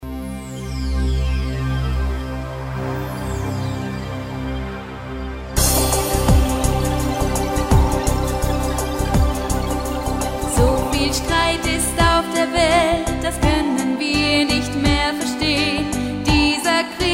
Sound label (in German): diese welt deutsch pop